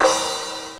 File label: Pulsar Crash, Estradin Pulsar